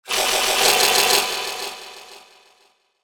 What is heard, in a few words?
sound design effect mechanical stutter; sound effects one